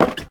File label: plywood step; Golden Crayon Sounds